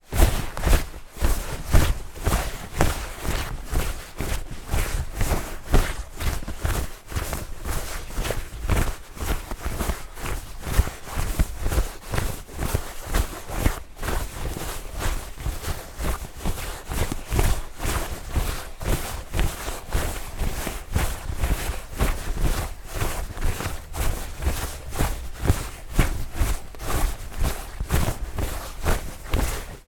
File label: foley clothing denim jeans pants walking